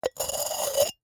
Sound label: Jar, terracotta, ceramic, lid, rubbing, scraping, grating, rough, ragged, swoop, lid tap; Eiravaein Works; Vaeyan IV